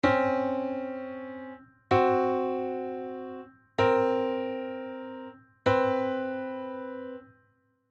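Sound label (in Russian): диссонансы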